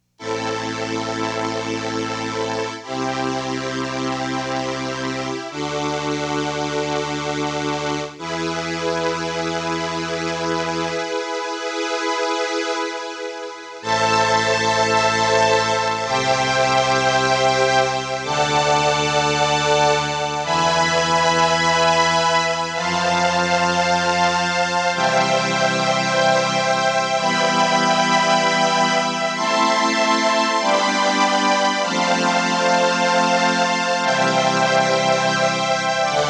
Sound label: CELLO, Crumar Orchestrator